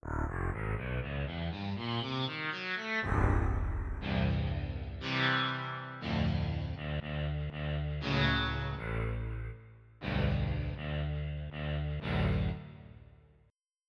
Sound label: Bowed El Sitar